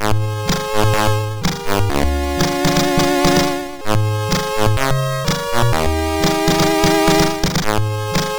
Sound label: Power of Black Bandit, MOS6581R2